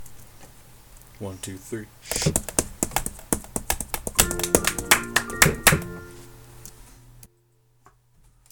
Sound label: spoon music